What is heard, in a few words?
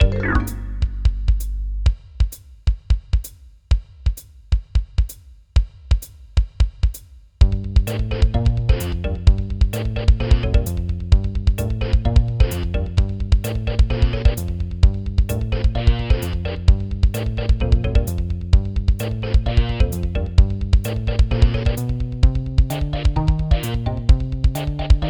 Funk, reworked MIDI; Gene Funk; Blake Stone